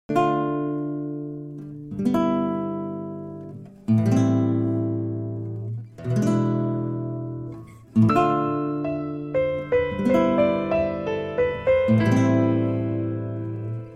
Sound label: gitara